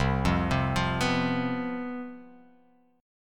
C Major 7th Suspended 4th

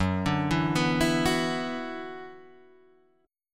Gb 7th Suspended 4th Sharp 5th; arpeggiated; 2,5,2,4,5,2; JGuitar

